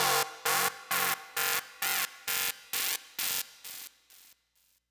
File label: Crunch Seq; Dr. Fink's Funk Factory